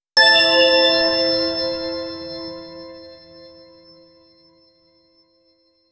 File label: short metallic chime impa